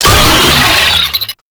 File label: Player Spotted, Vocals; Enemies; Warframe; Proto Infested; Brachiolyst